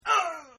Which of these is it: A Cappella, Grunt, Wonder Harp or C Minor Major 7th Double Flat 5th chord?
Grunt